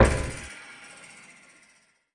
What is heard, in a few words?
Spring; Lethal Company